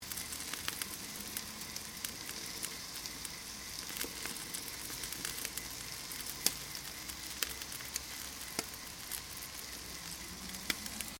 COOKING BBQ SAUSAGES SIZZLING LOOP, Barbeque cooking; Food; Household